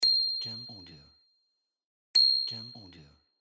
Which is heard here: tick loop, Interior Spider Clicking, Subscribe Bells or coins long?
Subscribe Bells